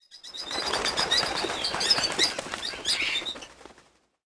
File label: rock birds; ambient; World of Tanks Blitz